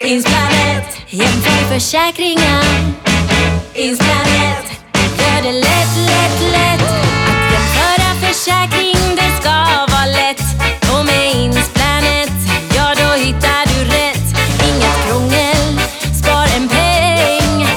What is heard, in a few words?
Jingel; Insplanet